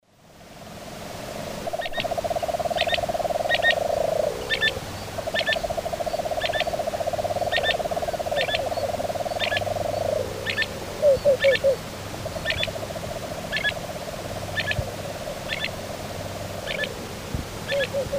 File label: Hoploxypterus cayanus, flight calls; S. America; Eastern Lowlands